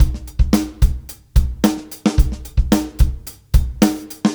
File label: Live Beats, R&B Drums